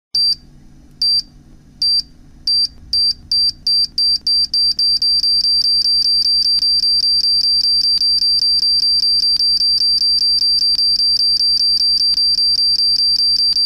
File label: countdown long